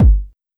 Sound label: Kick, Drum Hits